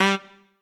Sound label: Trumpet melo